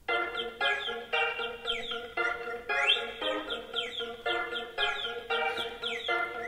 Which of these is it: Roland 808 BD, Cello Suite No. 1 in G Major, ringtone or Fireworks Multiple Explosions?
ringtone